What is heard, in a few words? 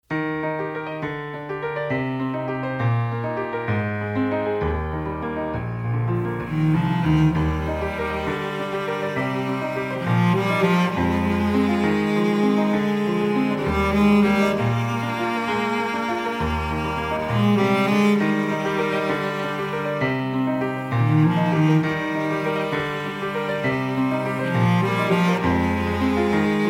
Instrumental